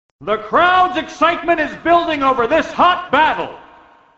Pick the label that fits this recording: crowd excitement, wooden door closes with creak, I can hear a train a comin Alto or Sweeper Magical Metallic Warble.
crowd excitement